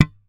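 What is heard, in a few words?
thumb, bass; Xsample VOL-1; SLAP+THUMB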